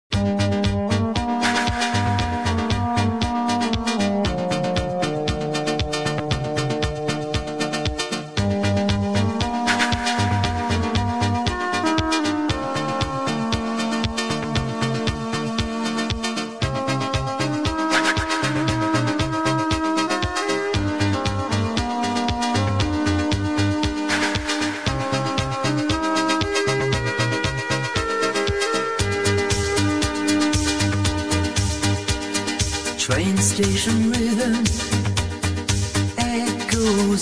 ai disco 80s

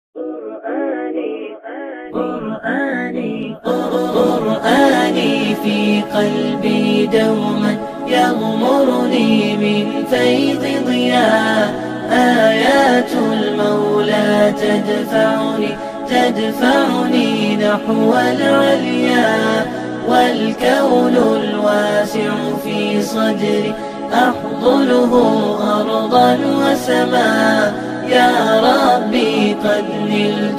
Arabic Nasheed, Beautiful Powerful Nasheed

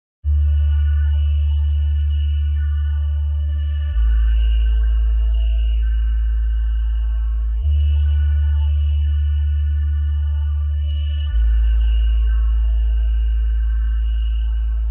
music bass boosted; Jbl music

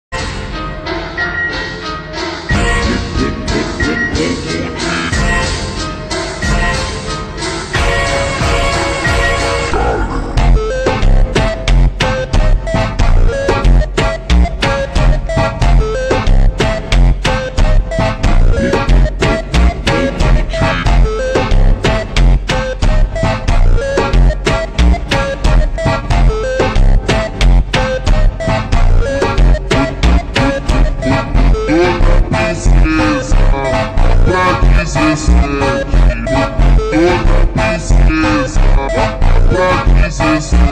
beeper funk slowed

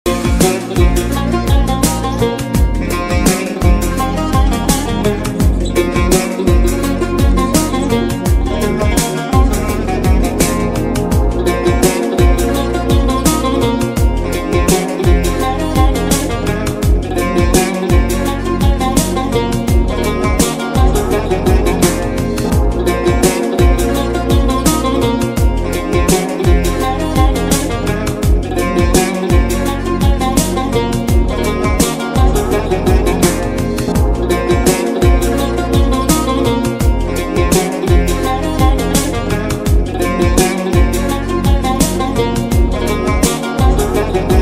instrumental enstrümantal fon müziği

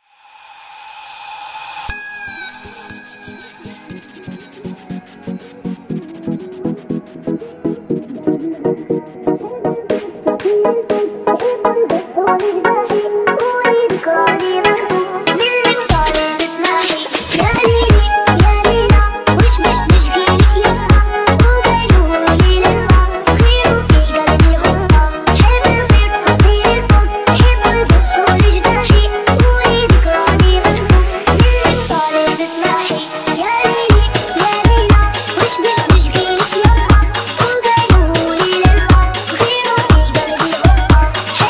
arabic remix balkan remix

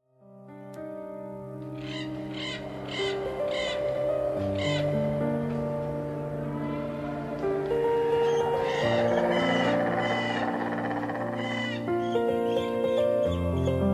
violin soul

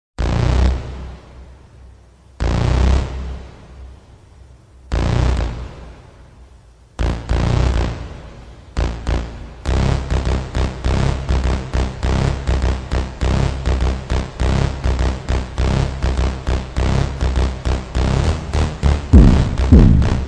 slowed reverb

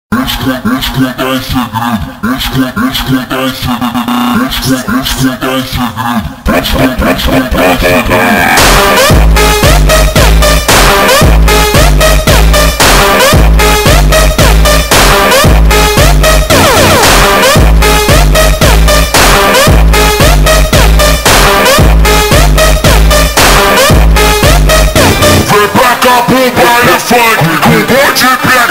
funk slowed reverb

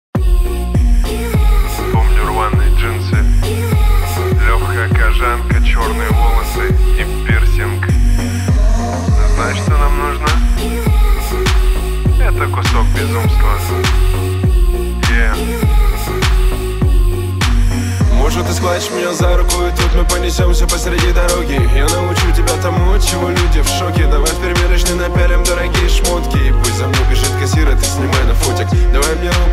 slowed down version

